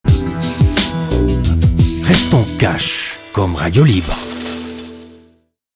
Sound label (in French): jingle